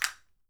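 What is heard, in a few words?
SHARK CALL, ethnic percussion; WorldSounds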